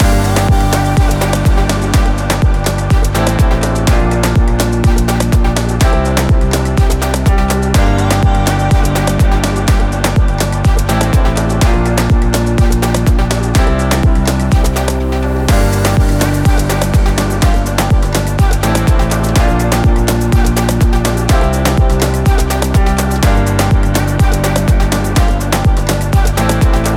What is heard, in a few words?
travel music loop